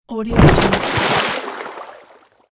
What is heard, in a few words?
push body into water